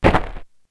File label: slam; Crash Bandicoot The Wrath Of Cortex; XBOX